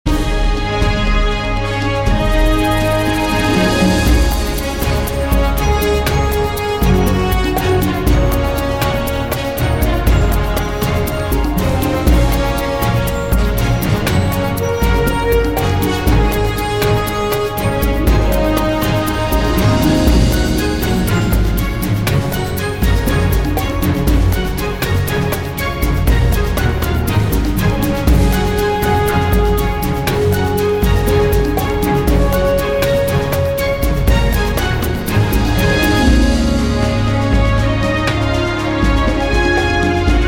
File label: music